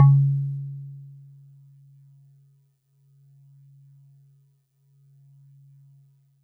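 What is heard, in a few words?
Celeste DX100 C#2; Pristine Celeste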